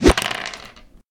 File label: roll